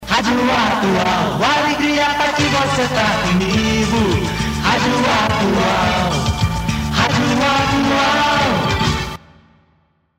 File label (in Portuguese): Radio Atual SP vinheta